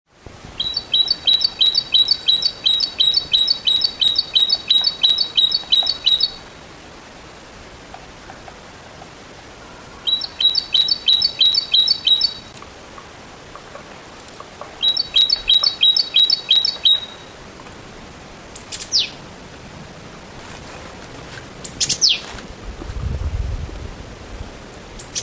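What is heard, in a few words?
Parus major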